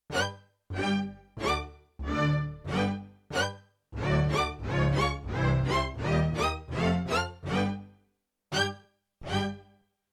Pitch-EG, Orchestra Hit; Orchestra Hit 2

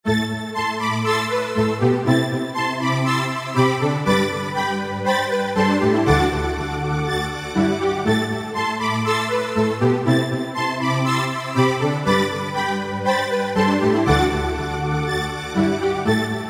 pad, E-mu PK-6; Paddy Cake

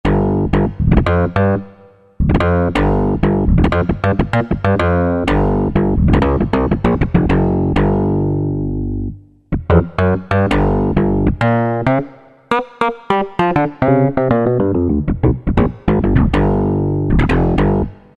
BA, Yamaha TG77; Factory Presets; 56 BA HardOne

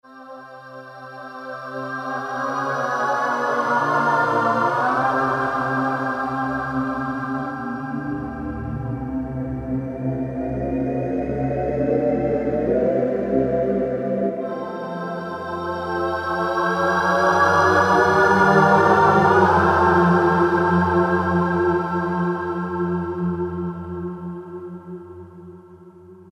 Ballet Voices; Roland PN-D50-04

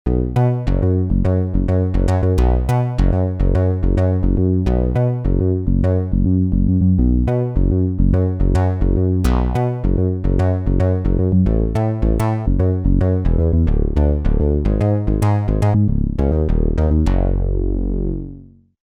Stereoww Bs, Roland JV-880 Factory Patches

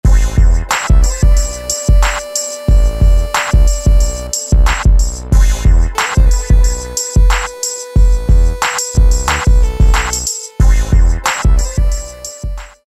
G-Funk

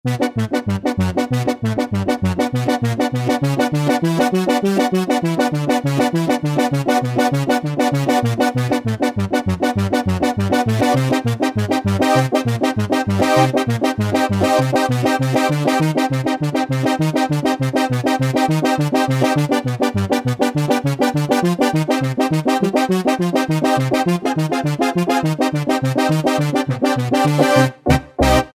MONSTER BRAS, Akai SG01v

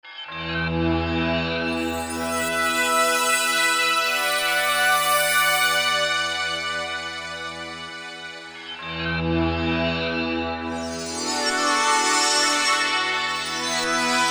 Brass Bells, Korg MSC-11S Brass

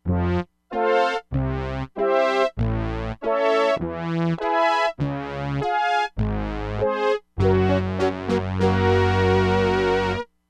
OCTIVE BRASS, Crumar Bit 99; Factory Programs

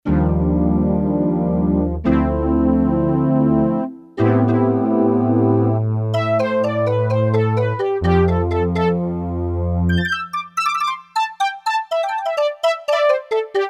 Roland D-10 Steam Pad; Factory Patches

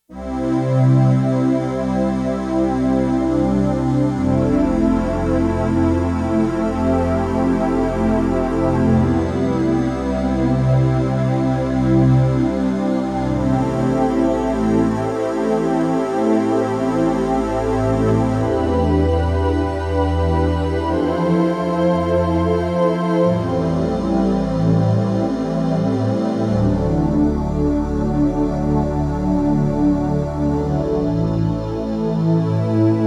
SYN CHOIR, Roland JX-1; Factory presets

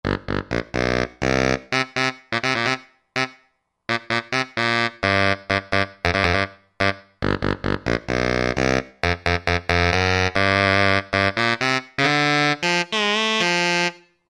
Baritone Sax, Roland JV-2080

